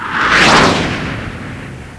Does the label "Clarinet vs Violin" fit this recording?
No